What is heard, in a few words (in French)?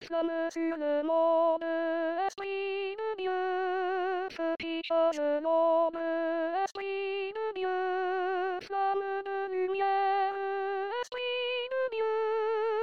soprano